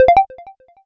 Manner Mode Release; Samsung SGH-i320; System Sounds

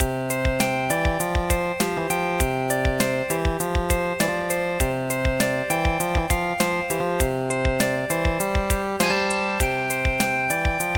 Ringtone SIM2, ringtones; Zen; Admire-Swadesh

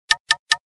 Knock; GD910

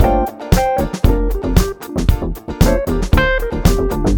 Funk; Google; NewWave Labs

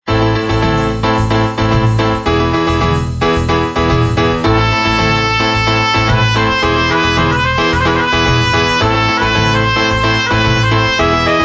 Salsa; A1000-CD

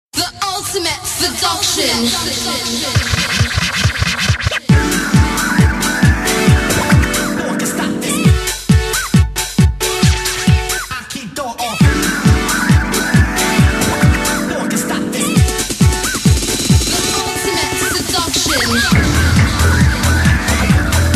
Stomp, Melody Sample; Sagem MY-V-75-CD

